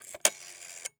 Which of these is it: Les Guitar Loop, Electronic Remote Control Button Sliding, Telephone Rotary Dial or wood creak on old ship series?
Telephone Rotary Dial